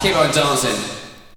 VOX SHORTS; Megabass Remix! 2

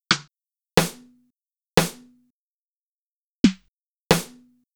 Snare, Snare Patterns; Beat Components

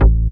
DR. LOOP, BASS; X-Static Goldmine 3

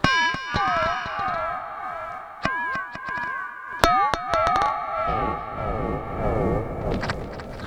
SPACEY L, Funk Guitar; Zero G - Funk Guitar

